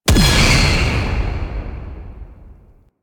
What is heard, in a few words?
Queens chamber braid destroy; Warframe; Ambience; The War Within